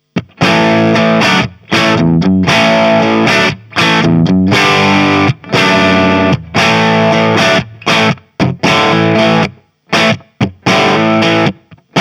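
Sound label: clean MID boost ES335; Bluetone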